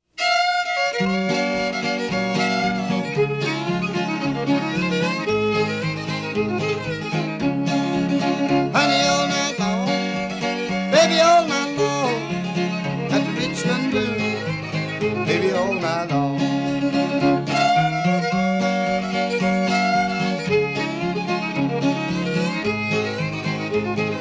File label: Blues